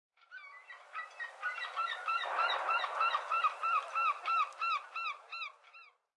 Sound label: Ambience Menu Seagull; subway surfers city sound effects; Android, iOS